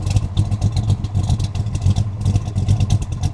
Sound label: 69 Mustang Idle Loop; Automobiles